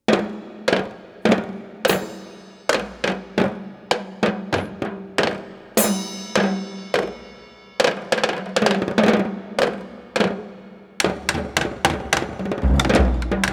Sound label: drums solo